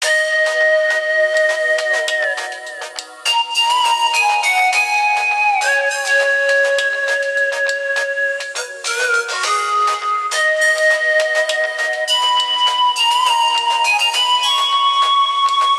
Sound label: ringer Folk song; ZTE Open-C